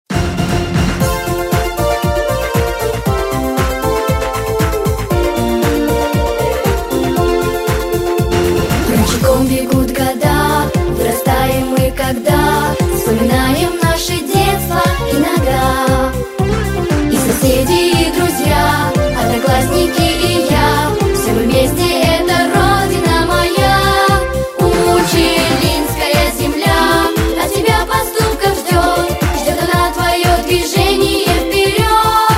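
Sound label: vocal group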